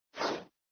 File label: lobby list swipe